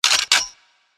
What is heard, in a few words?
CockBack; Trapaholics